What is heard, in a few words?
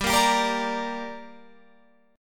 G Minor G down Guitar Open F, chord; Gm strummed